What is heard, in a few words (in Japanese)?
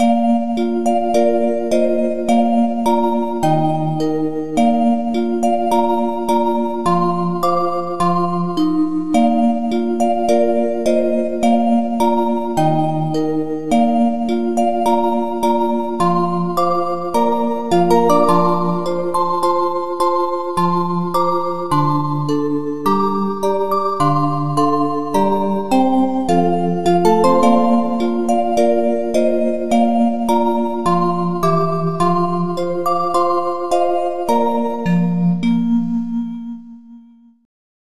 koka melody, 校歌